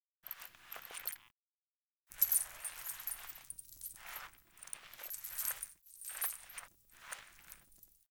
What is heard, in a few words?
Foley; Friendly; Verminia; Bromius Quest